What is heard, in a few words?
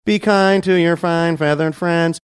singing